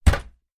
foley footstep single barefoot on metal step ladder rung; zapsplat